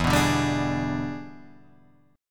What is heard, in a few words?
E 7th Sharp 9th, chord